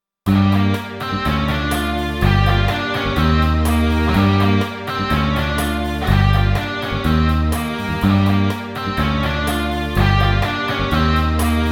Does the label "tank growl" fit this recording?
No